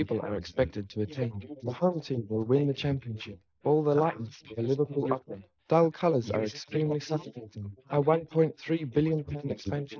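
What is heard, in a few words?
male, instantaneous mixture; SiSEC11; underdetermined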